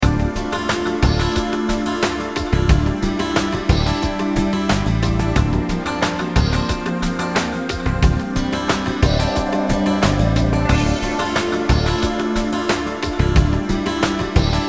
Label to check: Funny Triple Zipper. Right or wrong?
Wrong